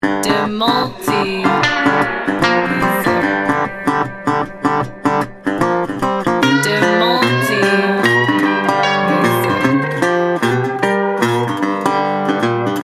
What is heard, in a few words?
instrumental ballad